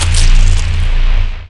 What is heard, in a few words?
blood